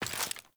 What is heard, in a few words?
Steps